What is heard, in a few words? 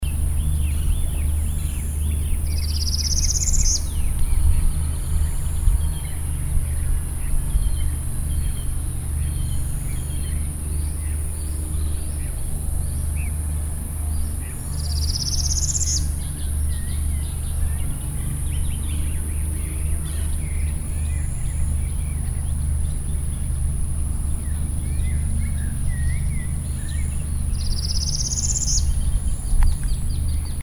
Tropical Parula; Anzalduas TX